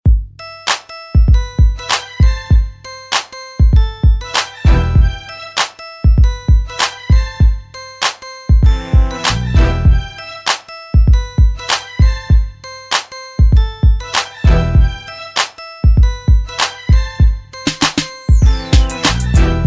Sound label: sms bass tone